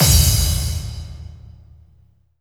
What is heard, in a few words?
BD-FX, Dance Mega Drums; Best Service